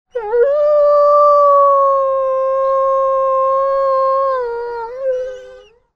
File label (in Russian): s poskulivaniem